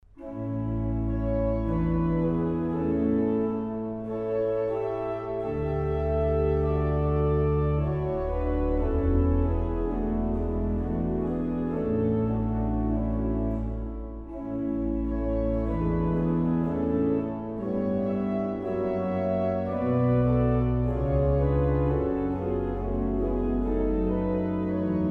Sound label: Sonate Andante tranquillo